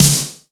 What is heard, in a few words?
SD, Yamaha RY-10, Drums